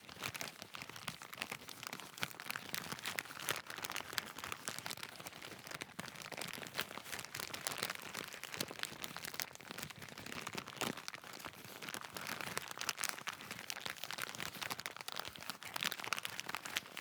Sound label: Moving Loops, Fastfood Wrapper, Loopable; Badlands Sound